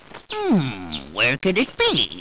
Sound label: hmm; Ranma95